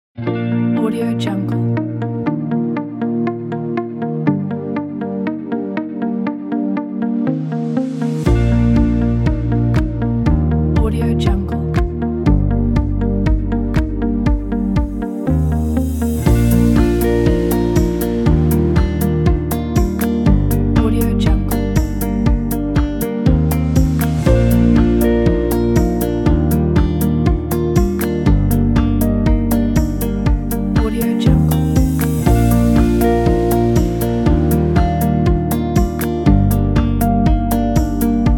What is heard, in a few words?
the ambient music